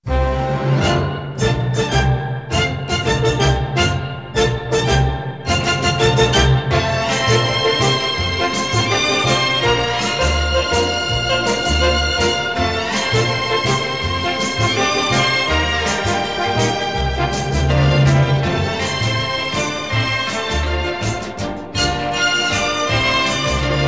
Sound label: Jingle